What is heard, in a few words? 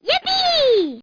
yipee; Microsoft Graphics Studio Greetings; Microsoft 1998